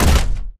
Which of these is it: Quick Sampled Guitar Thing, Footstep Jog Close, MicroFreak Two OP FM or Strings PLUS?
Footstep Jog Close